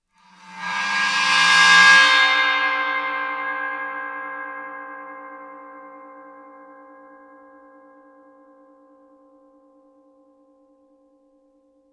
chinese bow, Suspended Cymbals bowed; Percussion

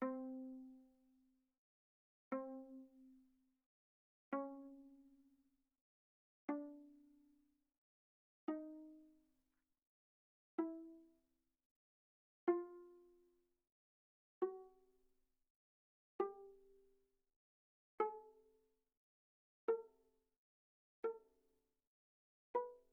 Viola pizz sul G pp C4 B4

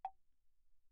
granite block, pp; Percussion; hand percussion; graniteblocks

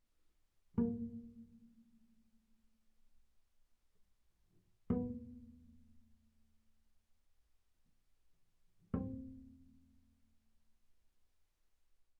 Bass pizz sul D mf Bb3 C4, double bass

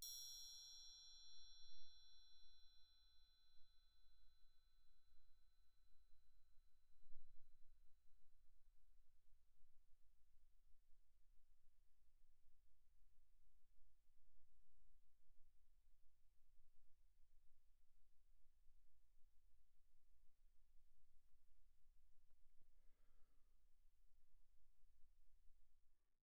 8 triangle, pp; Percussion; hand percussion

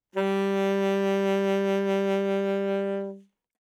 Alto Sax vib ff G3, Eb Alto Saxophone; Woodwinds; MIS Pitches - 2014

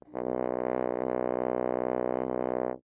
Horn ff B1, Brass; MIS Pitches - 2014

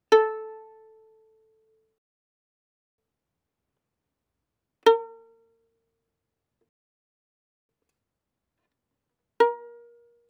Violin pizz ff sul A A4 B4

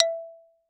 Xylophone rosewood ff E5 stereo; MIS Pitches - 2014; Percussion

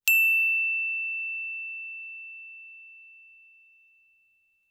bells brass ff E7 stereo; Percussion

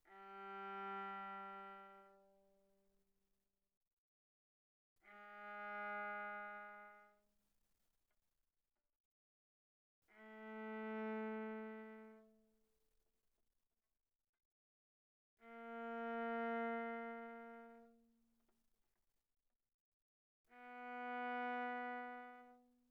Viola arco sul G pp G3 B3

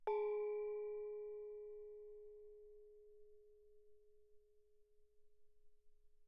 thai gong Ab4 mf; thaigongs

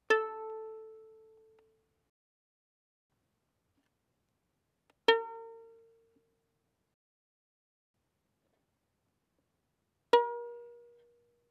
Violin pizz mf sul A A4 B4

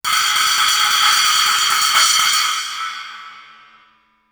splash stick roll, Suspended Cymbals; Percussion

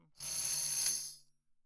tambourine finger roll ff; Percussion

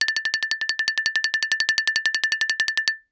Xylophone rosewood roll ff A6 stereo; MIS Pitches - 2014; Percussion

